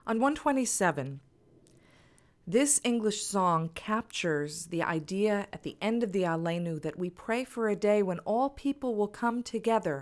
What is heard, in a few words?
English Song; Aleinu